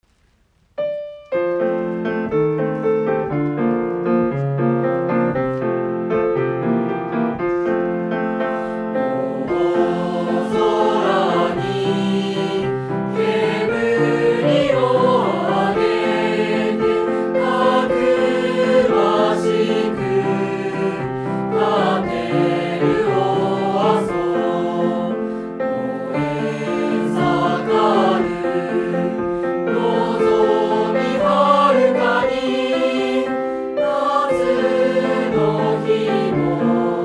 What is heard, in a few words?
music